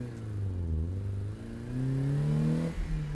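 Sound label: engine high gps turn; Blind Drive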